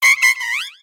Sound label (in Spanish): Grito de Audino